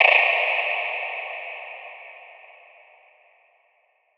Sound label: Textures Vocal O; Impulse Responses; Ableton Live 12 Lite; Hybrid